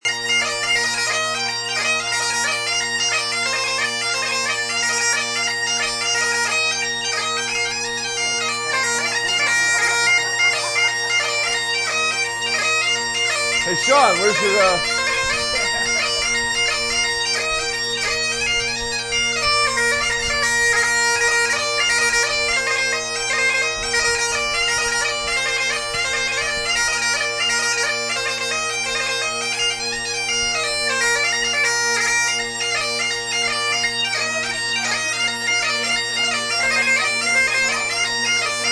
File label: bagpiper